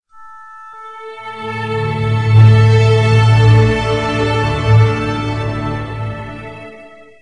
playstation 3 startup

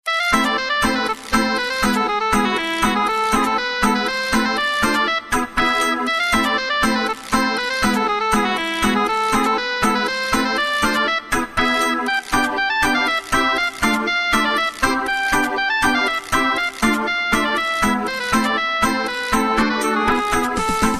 finnish polska

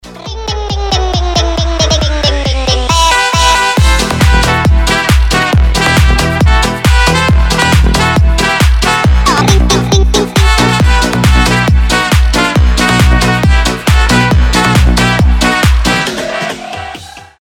ring ding ding ding

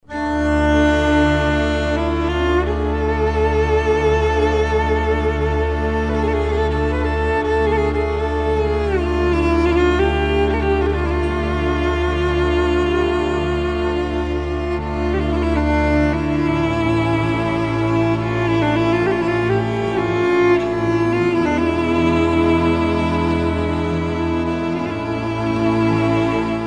serbian ethno

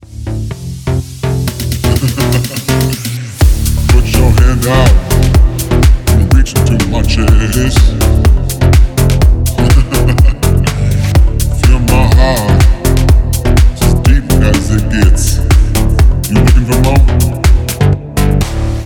bass

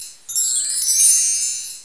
wind chime

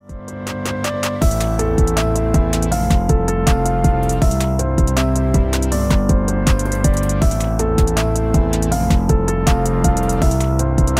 instrumental piano remix

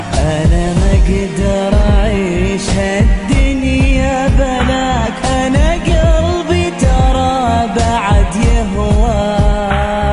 arabic

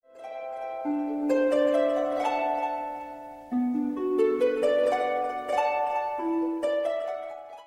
light harp

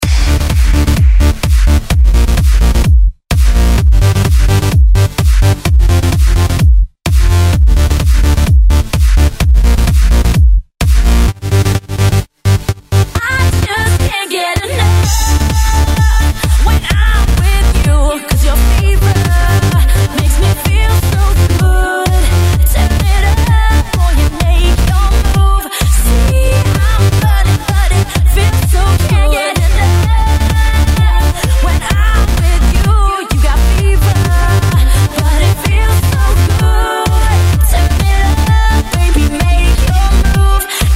electro banger remix

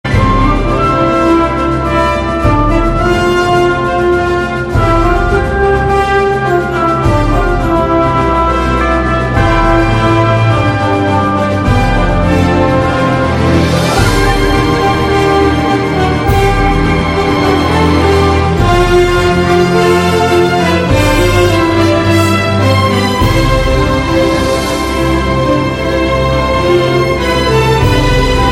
indian anthem

